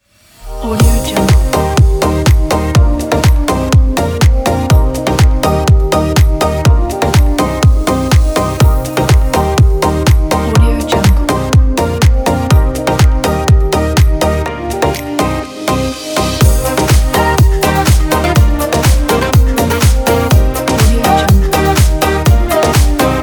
the upbeat pop

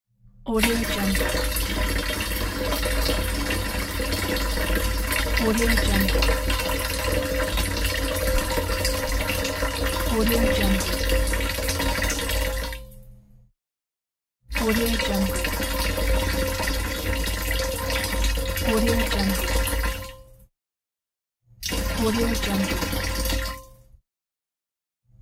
pouring water into a carafe

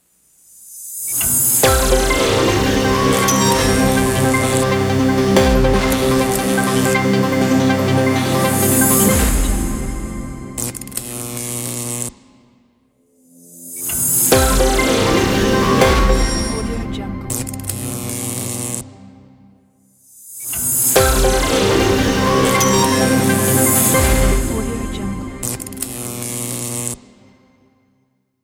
electric news logo

